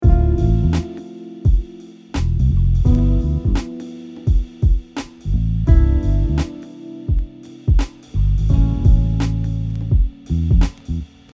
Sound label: targeting 3rds chord tones, guitar; Targeting 3rds example